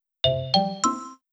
Jingle Normal Response

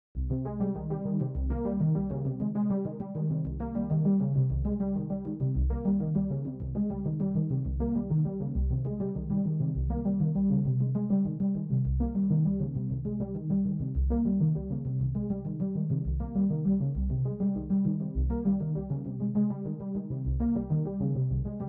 Synths

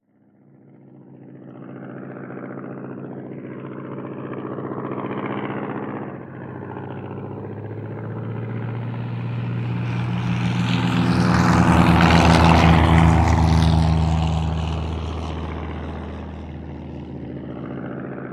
Monoplane Pass; The 39 Steps

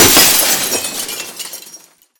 glass smashable large break; ProSoundCollection v1 3 MONO Impacts Smashable

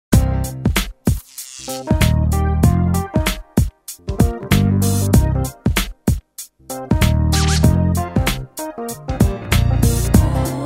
Menu (R&B); London Racer; PSX

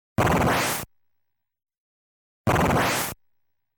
Enemy Death Sound; Kaijuu Densetsu The Tale of the Shell Monsters

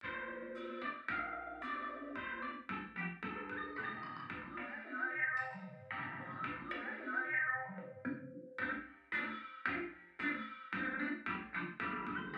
BGM Plaza Shops Steamed buns

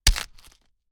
gore

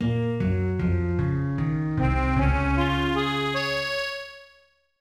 Music Lose; tales to enjoy three little pigs 2010 nds gamerip

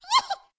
Hihi; talking tom cat 2 android ios online gamerip 2011

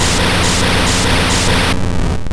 Dragon Rage Direct; Pokemon; Gen 1; attack moves; RBY

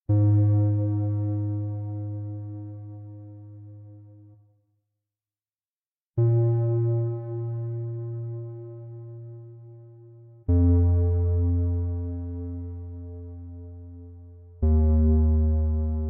Autumn Bass; Proteus

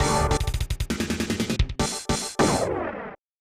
Clear Level; Kablooey; SNES

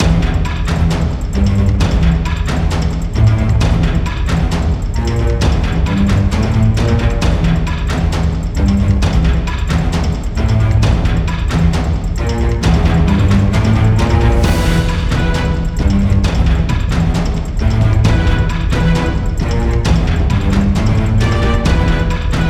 Countryside Fight; Serious Sam 4

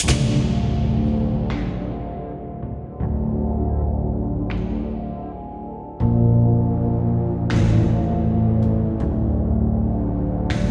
Workshop Music; Five Nights at Freddys; FNAF Special Delivery; android ios

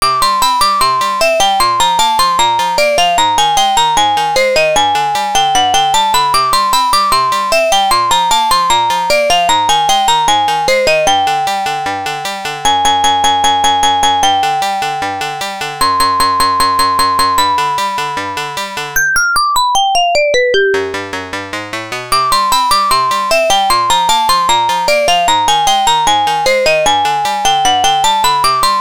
Limestone Cave BGM; Dragon Buster; PC-8801

